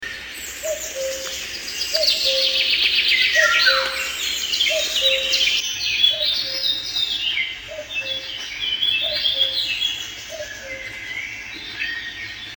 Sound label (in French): coucou